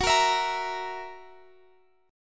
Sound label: F sharp 7th, guitar; F#7 strummed